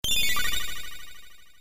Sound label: cure spell part2; ff3; ff3us